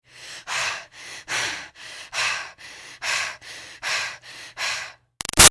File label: Human F Breaths Exercise Steady; Breathing; Big Room Sound